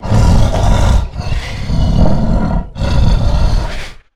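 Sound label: Stealth Breath Movement; Evolve; Goliath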